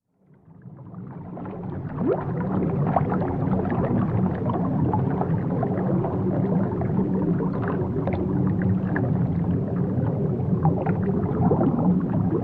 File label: Underwater Sound